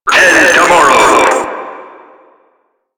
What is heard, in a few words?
Tentacle Swing; Warframe; Proto Infested Ancient; Abilities